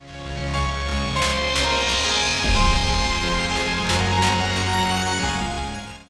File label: Electro; wsola